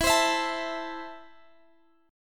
E Suspended 4th Sharp 5th E, down, Guitar, Half Step Down